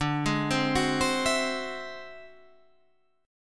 D 9th Flat 5th D, guitar

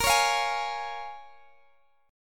A sharp Minor 7th, A sharp, down, Guitar, Open D

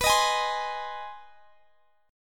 Ab Major B; Ab/B strummed; Ab/B Chord

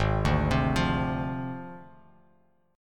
Ab 6th Flat 5th Ab, Bass Standard; arpeggiated; JGuitar

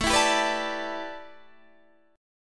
A 7th Suspended 2nd Suspended 4th Guitar Open D

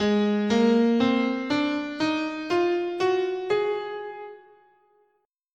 G sharp Lydian Dominant, scale

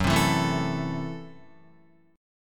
Gb Minor 7th Flat 5th, Guitar; strummed; JGuitar

